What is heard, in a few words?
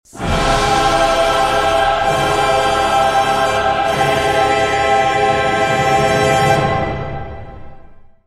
evil dead chant